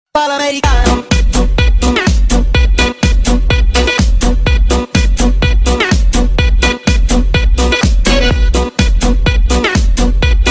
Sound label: win, music